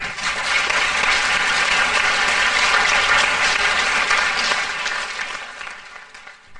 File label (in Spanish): aplausos